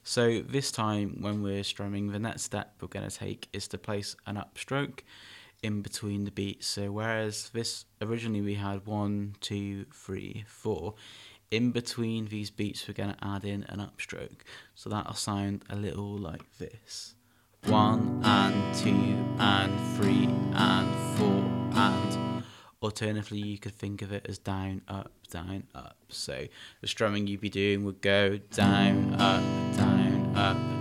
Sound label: Down and up